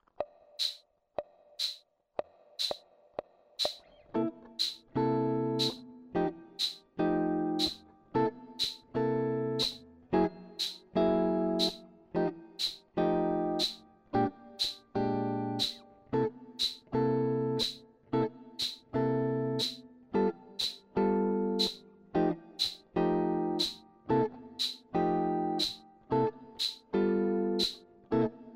C Blues Med

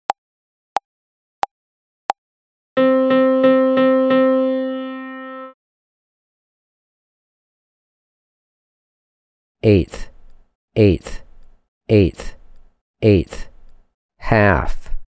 Rhy, 90BPM

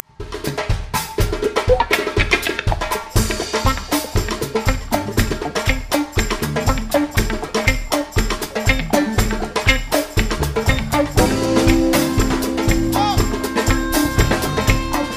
Sila Afro Funk

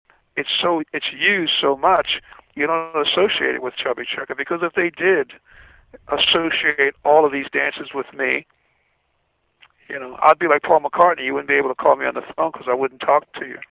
chubby whine

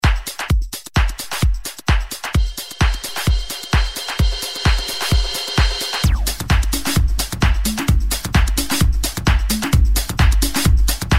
Disco Floor Silver Ball Mix

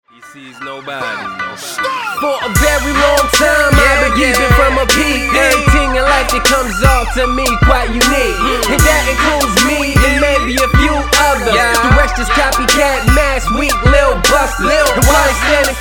new hip hop songs

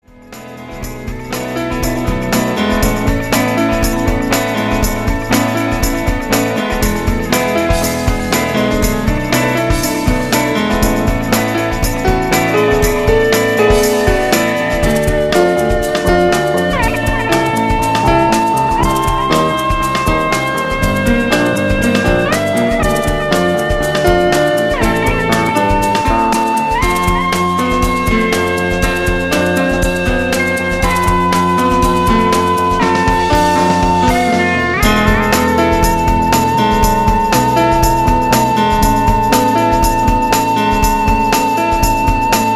Pop

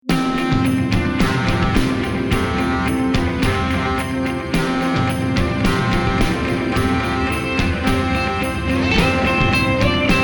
pop rock, Good King Wenceslaus

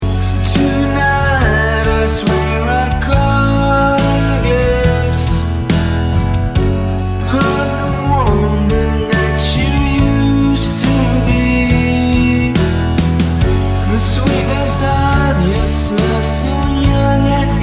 Verse and Chorus

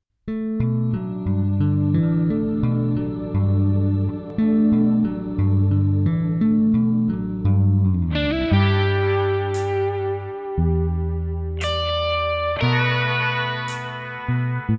Crystal

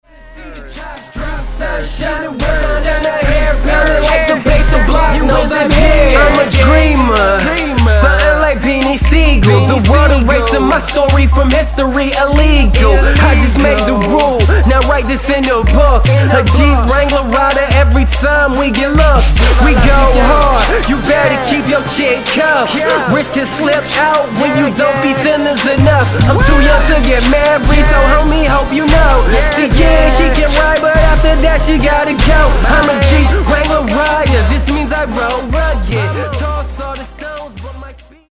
music; 2011 jeep wrangler

